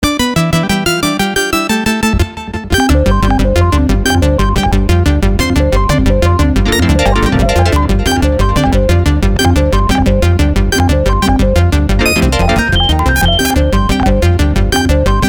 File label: JINGLE synth